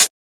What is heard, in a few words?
good high hat sound A key; hi-hat